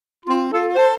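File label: jungle world music cage forcefield intro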